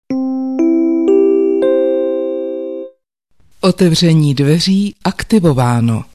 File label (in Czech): otevreni